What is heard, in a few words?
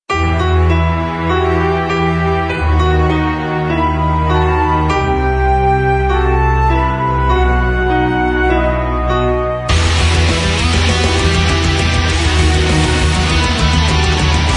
Symphonic Metal